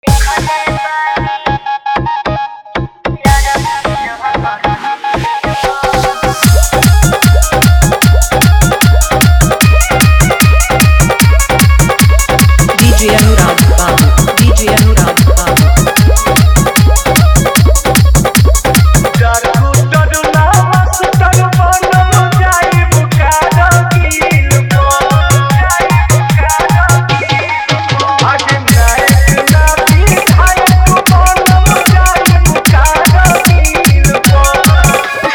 Full Dhollki Mix

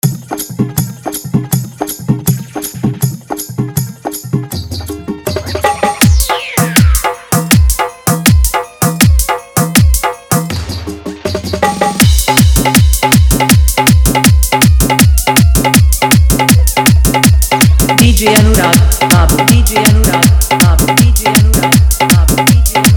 Bhojpuri Hard Dhollki Bass Dance Mix

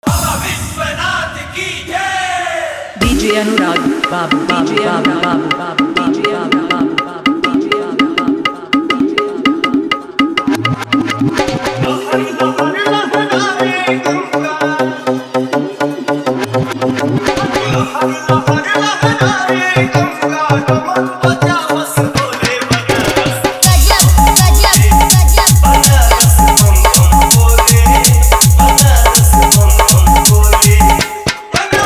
JBL Jhan Jhan Bass Hard Testing Bassing Mix

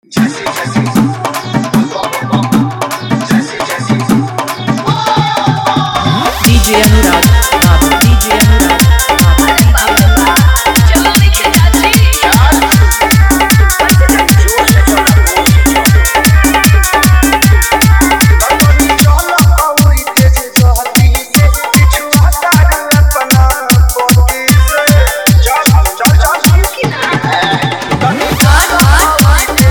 Bolbam Full Hi-Fi Dhollki Bass Rod Dance Mix

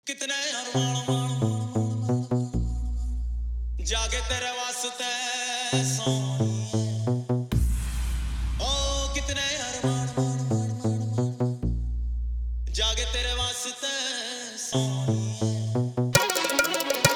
Old Is Gold Hindi Remix Song Jhan Jhan Bass Mix

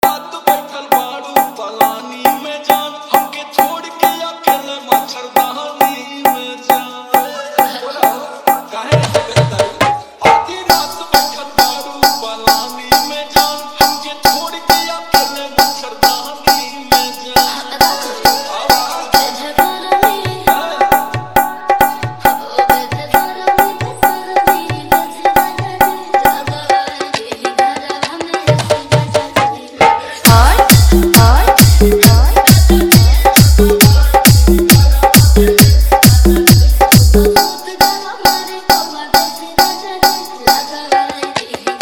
Jhan Jhan Bass Hard Bass Mix

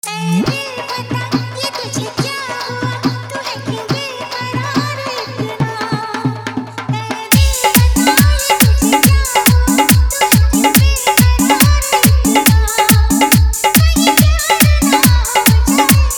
Hindi Love Hard Dhollki Bass Mix

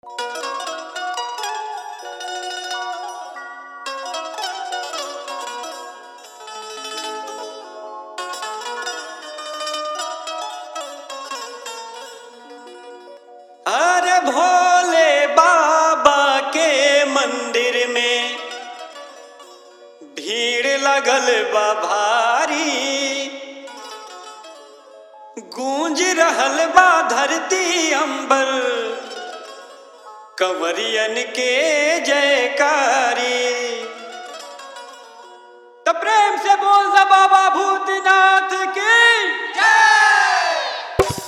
Old Bolbam Dhollki Bass Dance Mix

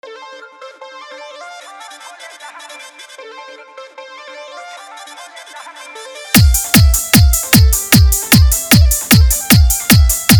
Bolbam Hard Bass Mix

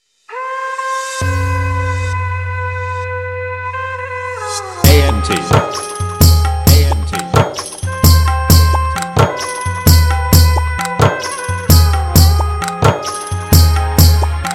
Sad Love Duff Dj Mix

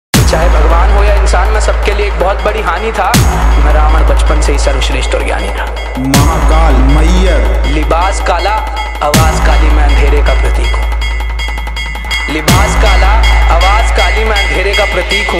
Dj Competition Sound Check Hard Dailogue Trap

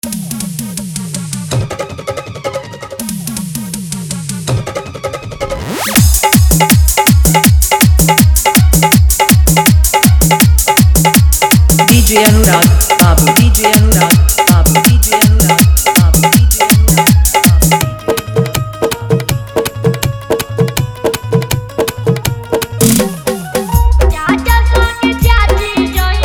Old Bolbam Fully Dhollki Bass Mix

